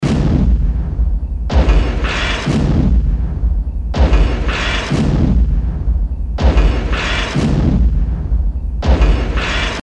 machinery loop

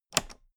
toolbox latch close heavy

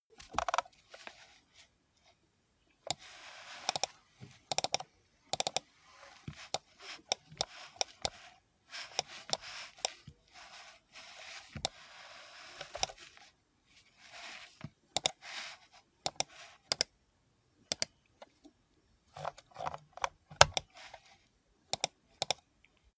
computer mouse usage sequence long

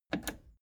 plastic button scratch click disable fast

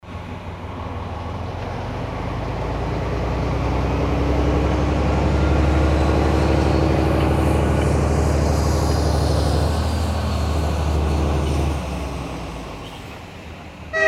train passing with horn

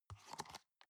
plastic box food small open soft

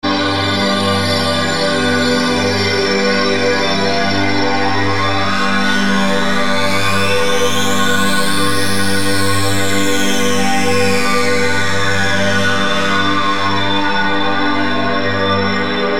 big resonant sweep

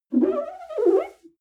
clean window paper towel slow whimper

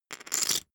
poker chip stack big rattle

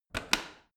washing machine rotary button press hard

